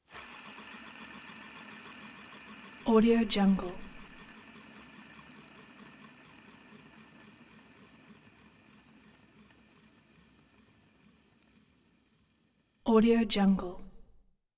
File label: synchronizer old 35 mm footage counter spin